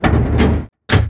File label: Hauling